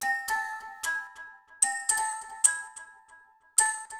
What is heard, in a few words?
Child Play, Woodwind